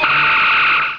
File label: Phaser; That - For Windows 95; FLEET